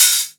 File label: Cymbals HH Open; Vengeance Samples